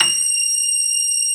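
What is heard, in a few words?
grand piano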